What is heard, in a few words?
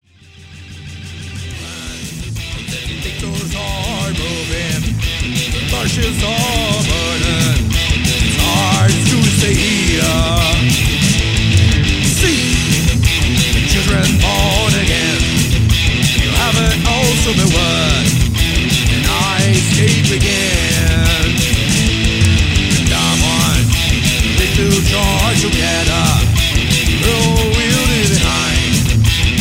rock house